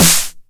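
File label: 909 SD Tape, snare drum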